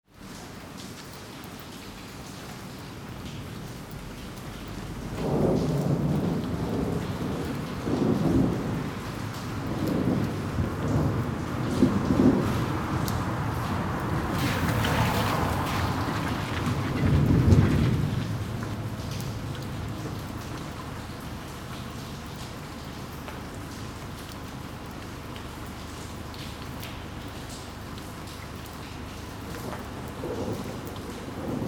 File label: taxi ride with rain